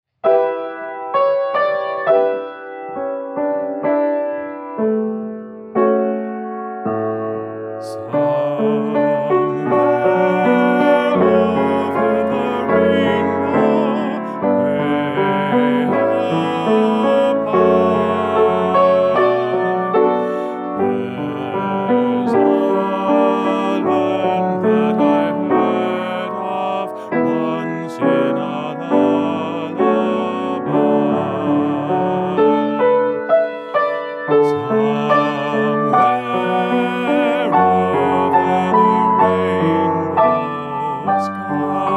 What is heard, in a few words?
Baritone Piano